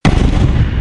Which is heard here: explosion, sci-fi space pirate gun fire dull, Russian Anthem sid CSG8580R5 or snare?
explosion